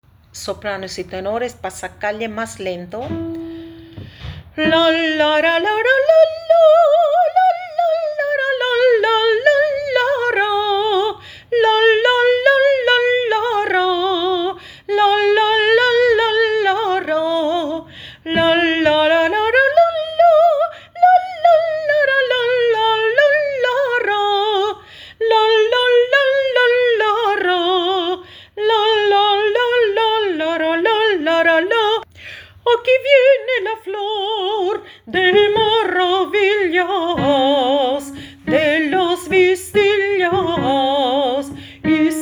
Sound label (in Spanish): Pasacalle Sopranos Tenores